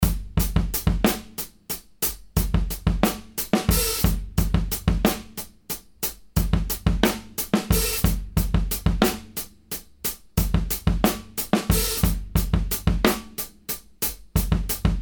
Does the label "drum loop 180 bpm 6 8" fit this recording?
Yes